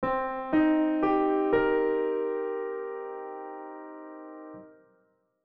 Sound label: c minor 7 chord